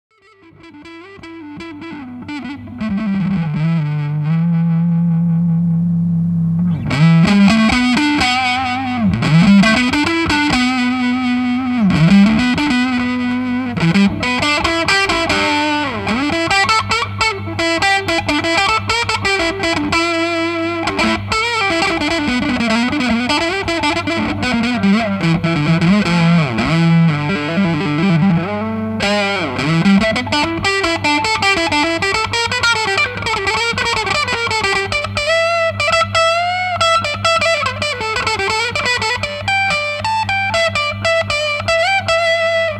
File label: tone; big soco pi